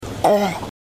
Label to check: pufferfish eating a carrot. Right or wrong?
Right